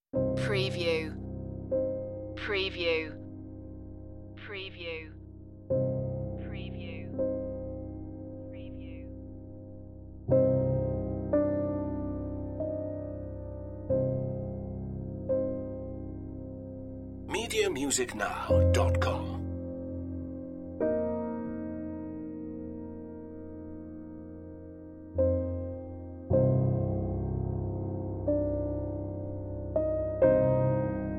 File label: piano only